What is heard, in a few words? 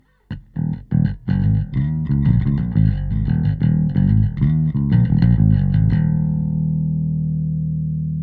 bass Bass Dist Weight and Presence; AMEK